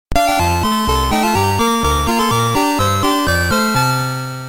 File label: Round Complete; Kwik Snax; Commodore 64